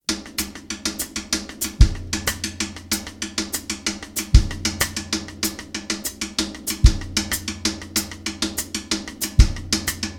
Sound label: Cascara Groove; Cascara-Groove 5; Schwarzes Album; Bum Bum Tschack Jazz Latin